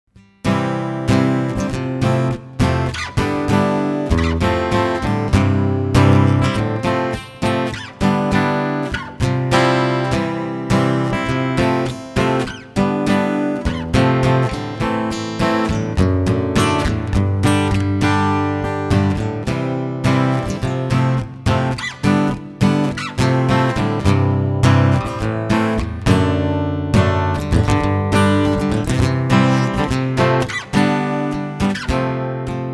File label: Orange FX Pedal Acoustic Treble